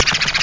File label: scratch; BCI NET; Amiga 8-bit Sampled Voice